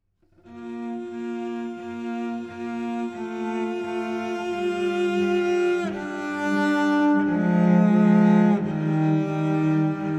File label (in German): Largo Cellosonate; Vivaldi